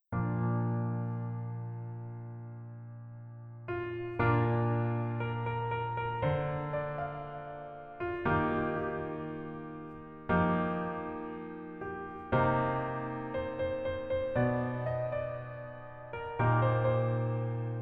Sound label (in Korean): Piano ver 멜로디 MR